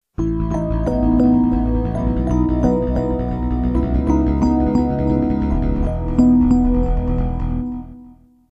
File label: bass